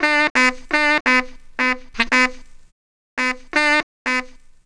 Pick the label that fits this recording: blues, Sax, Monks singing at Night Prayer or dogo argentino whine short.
Sax